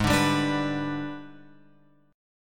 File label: G sharp Minor Major 7th Flat 5th, chord; G#mM7b5 Chord {4 5 5 7 X 7} strummed